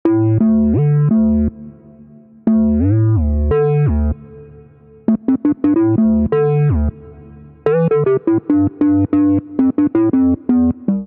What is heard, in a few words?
Sine D Minor 86 bpm; MIDI Clips; Tonal; Melodic; Ableton; Factory Packs; Chop and Swing